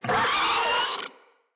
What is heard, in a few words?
Infested Charger Death